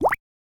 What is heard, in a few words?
Water Drop Notification Evening; Xiaomi Redmi-8A